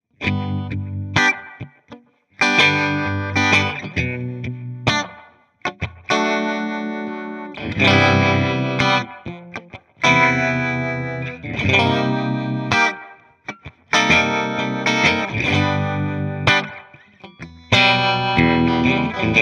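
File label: warm tremolo; malibu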